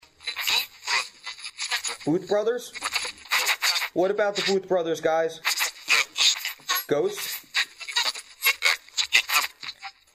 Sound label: ghost, backing out